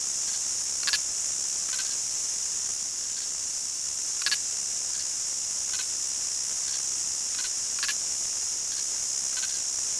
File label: ins loop; DAoC